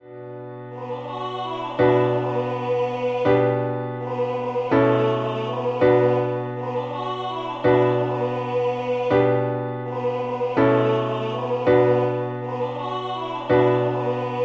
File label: MIDI SATB